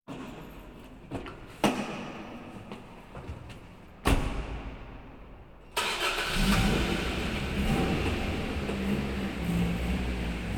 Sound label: Automobile Leaving From Parking Garage; KMP Film Video Sound Effects